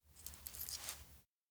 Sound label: feed konpeito; awayuki; anemoi